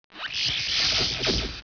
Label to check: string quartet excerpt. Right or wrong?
Wrong